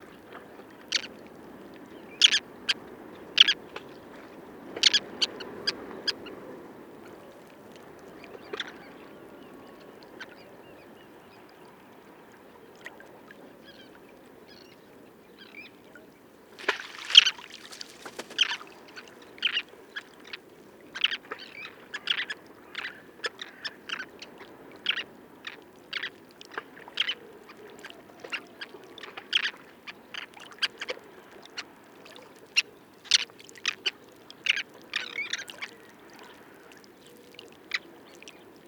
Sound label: roseate tern